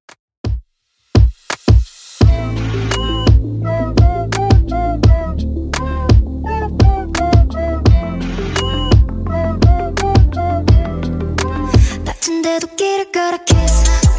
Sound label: Top40 DJ Friendly Redrum 85bpm; remixplanet